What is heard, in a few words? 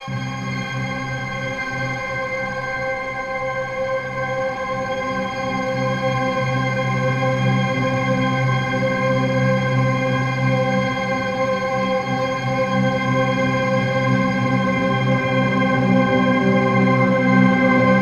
Nordic Spheres; SonuScore; Bjornoya